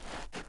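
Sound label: shuffle, footsteps hard surface jump up; dirt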